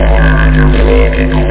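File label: didgeridoo; elysium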